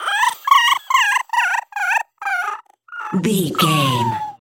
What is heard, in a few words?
goblin fairy cry